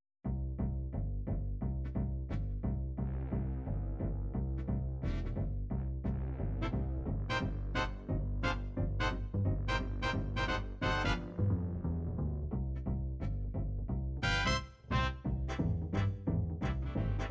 jazz bigband jazz, midi

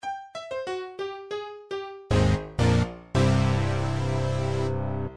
Super Nintendo break game over, midi; mario paint; rapidkirby3k

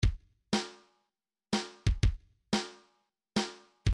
swing kick snare 2 bar

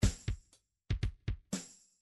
midi drums, shuffle

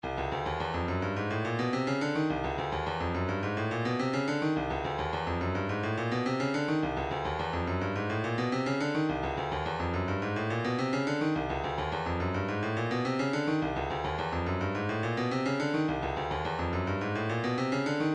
106 tambourine, midi

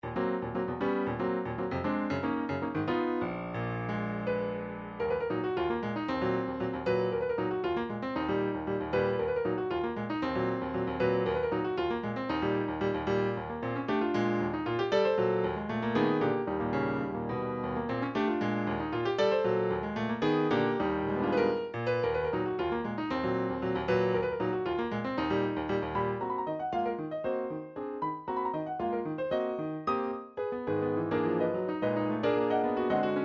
prelude allegro ben ritmato e deciso, midi

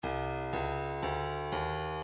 breakbeat 121 bpm kick; Stylus Breakbeat Midi Files

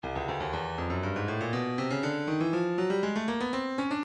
breakbeat midi files 120 121 bpm 120; Nightlife E; Stylus Breakbeat Midi Files

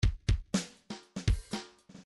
bdf midi file grooves funk misc funk misc

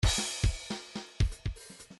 midi file grooves funk

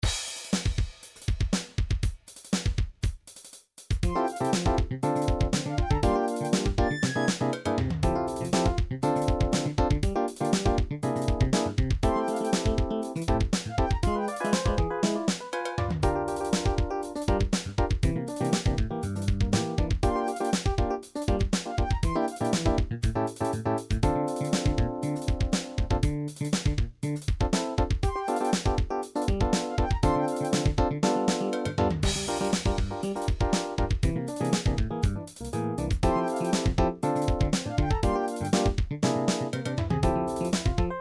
jazzfusi

